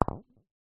sport bowls lawn ball strike another

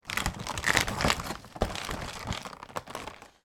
foley box chocolates handling; sound effects one

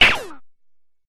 Laser Like Synth Dirty Distorted Laser Oneshot; sound spark

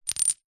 glass small disc set down concrete

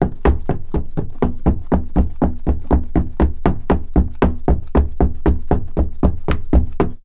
Footsteps Female - Wedges Wood Run

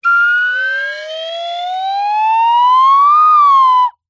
cartoon slide whistle double pitch ascend

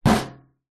household bbq lid metal close; zapsplat

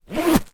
sleeping bag toggle tighten

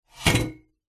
household stool chair set down tiled floor

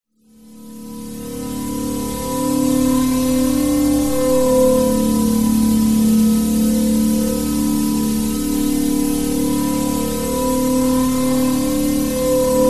synth drone

